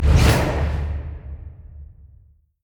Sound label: summonerspell base ghost; LoL